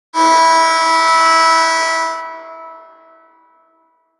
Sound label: nhl end of period sound